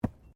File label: Body movement Ground Impact Light; Body movement foley to simulate falls and deaths; Wrestling And Struggling Sound Effects